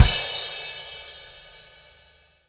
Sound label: CymKick, Latin Percussion; Sonic Foundry (Sony Creative Software); Joe Vitale Latin Percussion